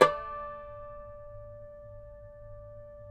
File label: CUMBUS, Guitars; Spectrasonics; Hans Zimmer Guitars VOL-2